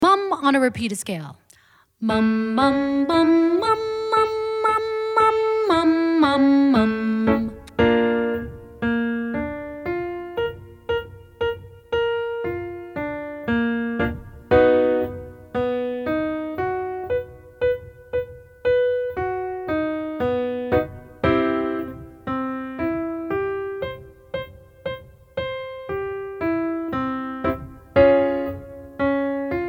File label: mum repeated octaves high range, vocal exercises